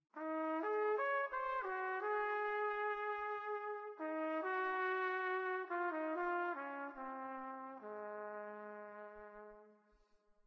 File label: Trumpet; Zomboid; Lifestyle